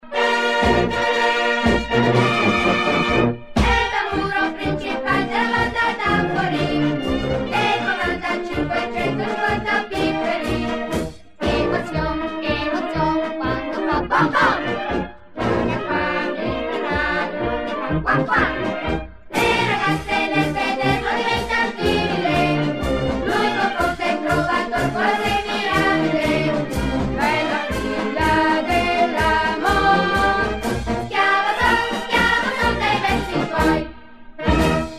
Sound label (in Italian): tamburo banda